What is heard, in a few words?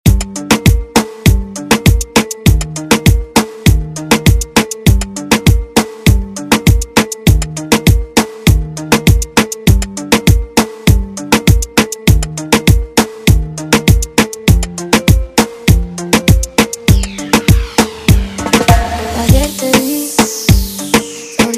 Reggaeton Redrum